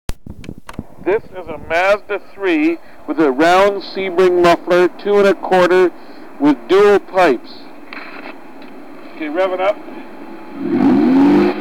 mazda 3 dual

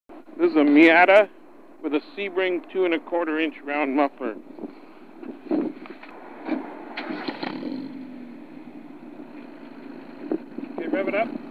miata round sebring

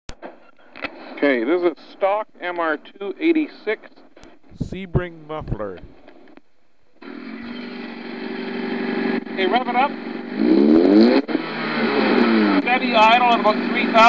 mr2 sebring

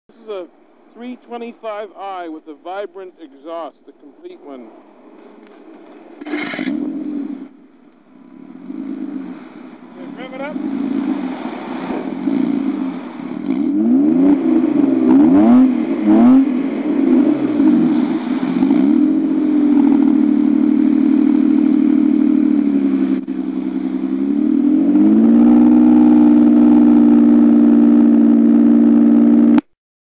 bmw 325 vibrant

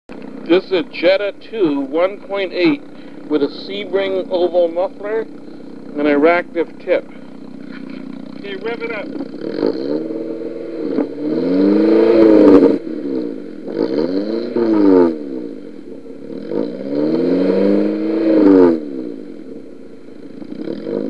jetta

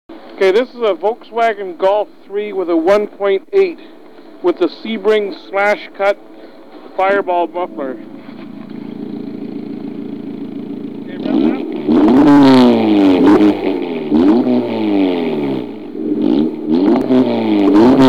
golf 3 fireball

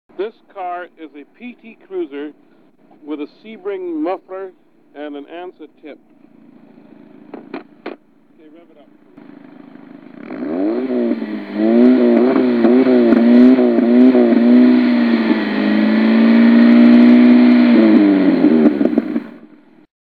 pt cruiser

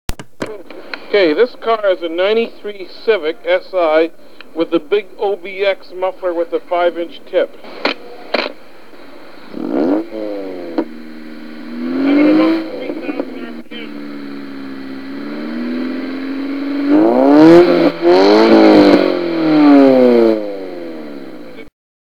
civic; obx

